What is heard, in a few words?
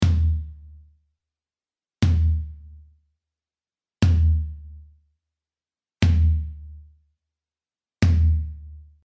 E Tom